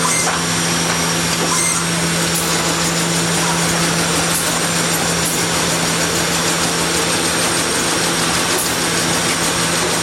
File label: Axis and Tufting